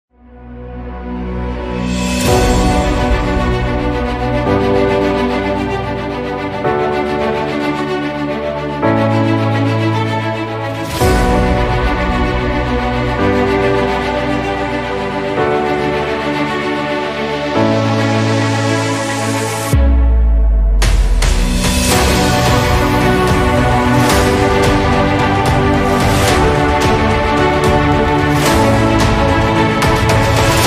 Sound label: saz